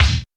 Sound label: U220 FX Set, Drum Machines; Roland U220